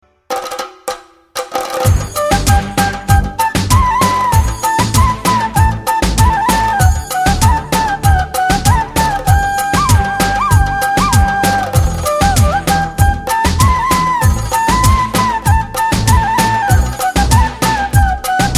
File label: spanish masala